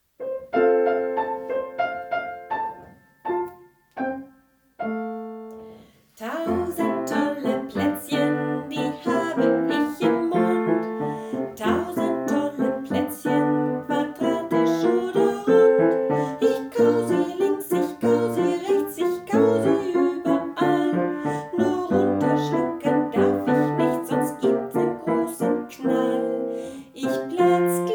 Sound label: Vocals guitar